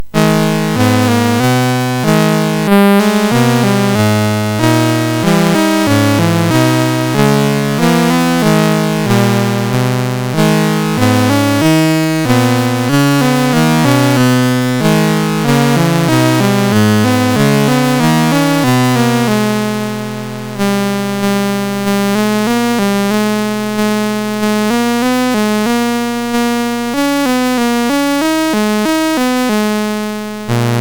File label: sid MOS6581R4; Faery Tale